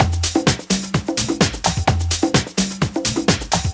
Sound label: Percussive Loops 128BPM; Vengeance Samples